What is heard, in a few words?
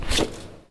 slide